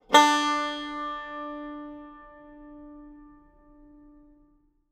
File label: banjo